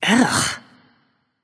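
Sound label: ugh, female; smite; voicepack; hera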